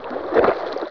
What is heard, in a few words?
menu popup